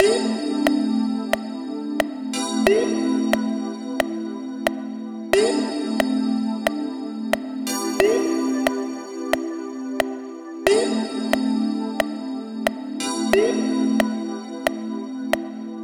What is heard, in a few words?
Metronome; Scratchin Melodii Sage 2022 demo